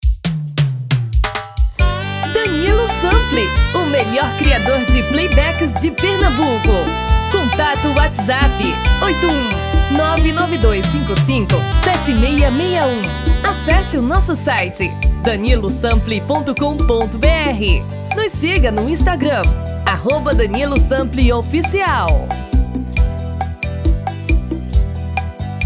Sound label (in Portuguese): tom feminino